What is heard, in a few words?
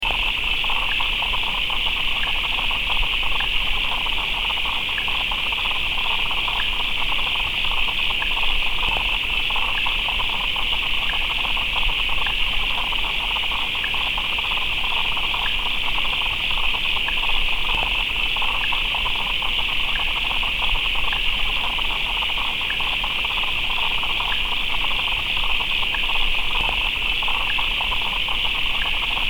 frogs Street; Stodart